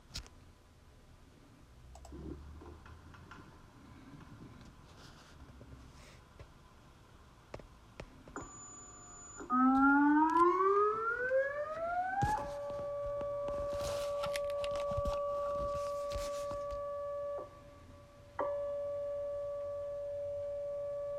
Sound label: Emergency Management Siren